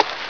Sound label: RUSTLE